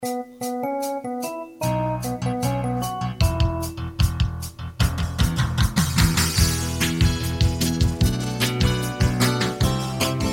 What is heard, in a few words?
Concert Bed